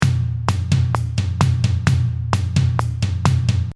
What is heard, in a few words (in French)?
ligne 1 deux mains, batteur